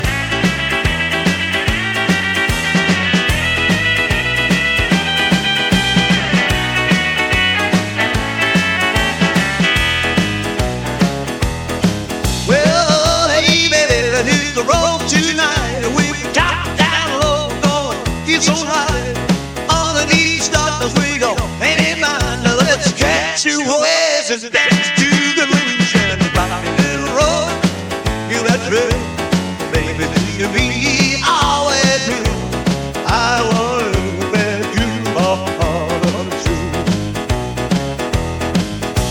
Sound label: Rockabilly; 50s rockabilly classic brief dance hit